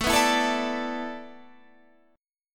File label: A Suspended 2nd Suspended 4th; Asus2sus4; strummed; 17,14,14,14,15,17